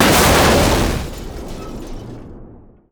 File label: ships destroyer attacked; Hearts of Iron IV Death or Dishonor